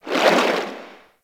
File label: Shark Tail Move Swim; Warframe; Grineer Ocean Shark